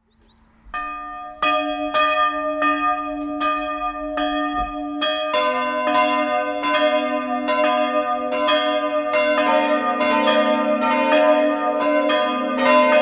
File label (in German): Glocken, Kirchanhausen, Pfarrkirche Mariä Opferung; Idealquartett: g'-b'-c''-es''; im Jahr 1950; in Erding; Karl Czudnochowsky